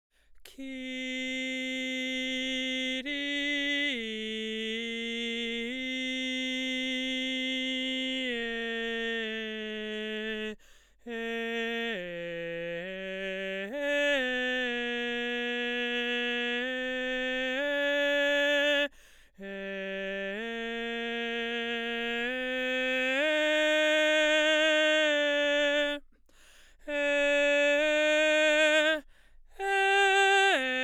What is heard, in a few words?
motetus